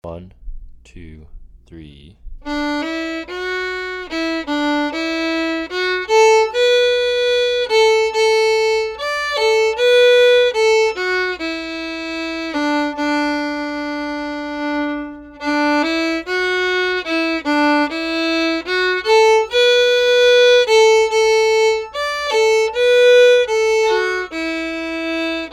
sally garden fast

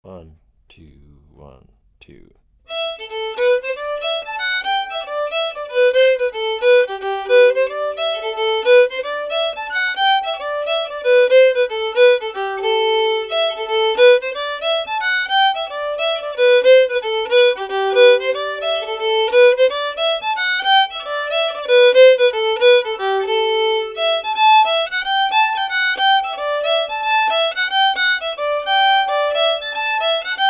sliabh russells jig fast

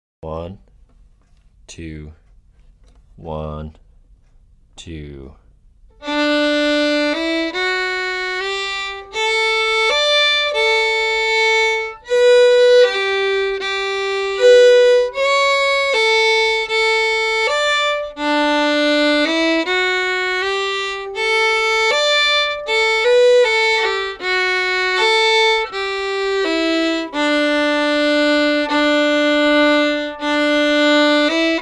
polka slow

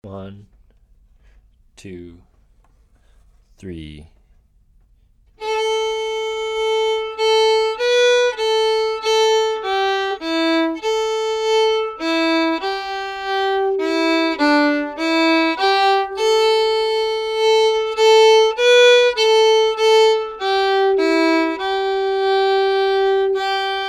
elizabeth kellys slip jig slow

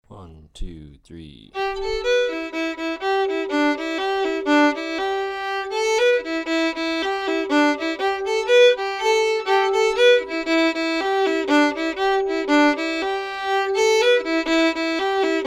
reel fast; gougain reel

